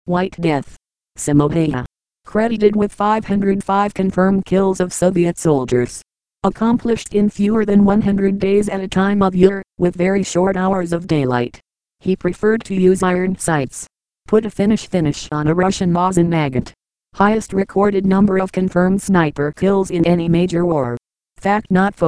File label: Voice Synthesizer